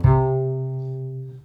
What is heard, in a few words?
BASS, 140 BPM, Drum & Bass; Ueberschall; Drum & Bass Flash Trackz